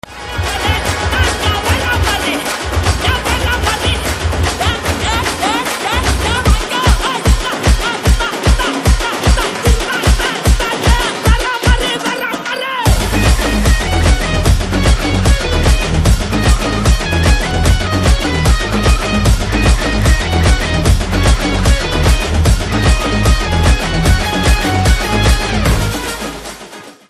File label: Drum Beats Ringtone; Tamil; from Valimai Movie